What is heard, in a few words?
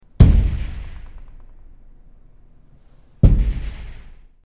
Glass Door Knocks With Door Rattle And Reverb, Pounding On Door With Fist In Reverberant Hall; knocking